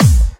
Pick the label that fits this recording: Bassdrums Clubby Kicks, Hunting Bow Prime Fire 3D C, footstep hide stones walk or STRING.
Bassdrums Clubby Kicks